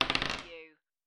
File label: Dice Throw One Die On Wood Table